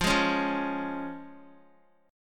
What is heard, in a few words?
F Minor Sharp 5th F down Guitar; Fm#5 strummed; JGuitar